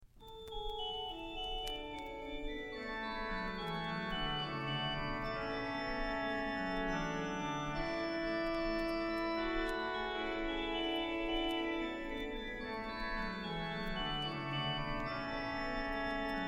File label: In dulci jubilo BWV 608, Hi-Fi Orgel; Bach